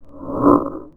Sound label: Noise; aloneinthedark4